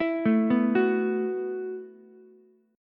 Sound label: E Suspended 2nd Suspended 4th E, Ukulele; arpeggiated; 9,9,7,9; JGuitar